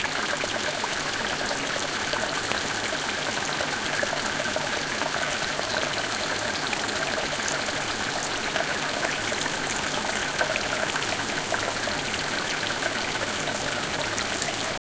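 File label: bouncing water in atmosphere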